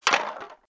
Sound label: sardine can physics; Angry Birds Seasons